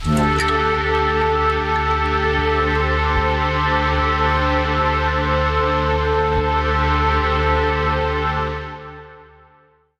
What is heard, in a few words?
Dark synth key of D no tempo no percussion